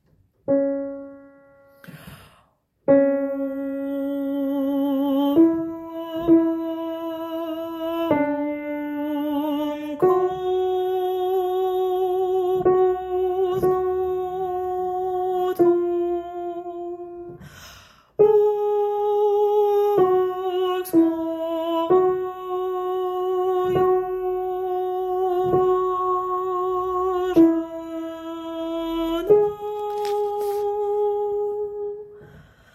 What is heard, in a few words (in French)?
alto du haut